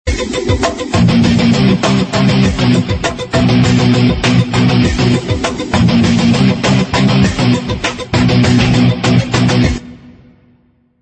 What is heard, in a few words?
heavy ringtone